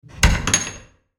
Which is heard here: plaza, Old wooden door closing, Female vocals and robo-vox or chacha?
Old wooden door closing